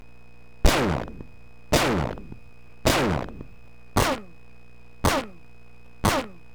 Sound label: Bright Tom Distort, Simmons drums